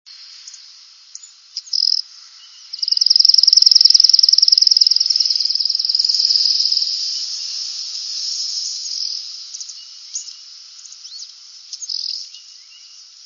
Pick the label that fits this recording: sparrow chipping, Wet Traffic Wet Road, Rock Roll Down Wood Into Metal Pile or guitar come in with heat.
sparrow chipping